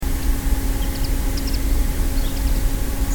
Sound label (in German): bluthänfling